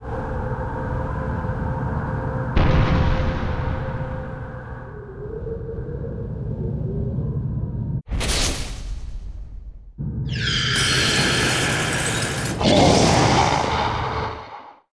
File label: Appear